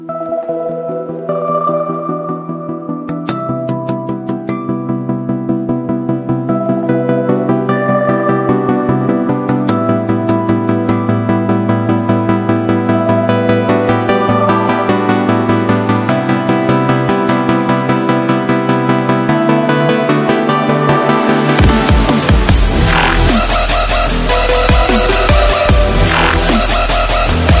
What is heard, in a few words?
Mobile Phone Ringtone